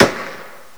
mp40 attack; WAR

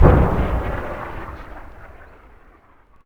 distant shot; WAR

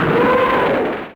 katyusha; WAR

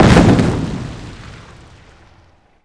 medium impact generic; WAR

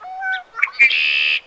red wing

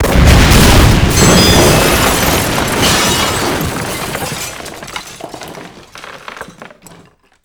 HQ destroy stereo; WAR

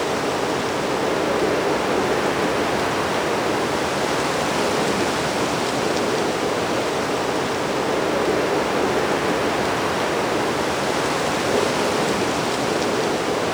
blowing through distant; WAR

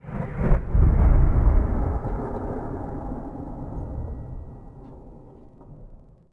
distant gun; WAR

